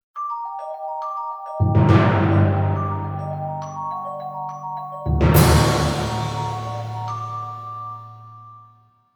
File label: perc mystical